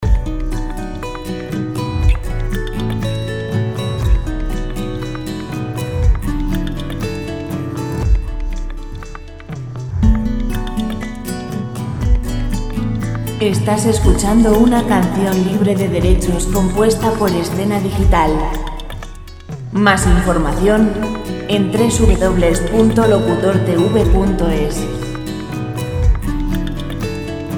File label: 120 guitar lent; guitarra musica libre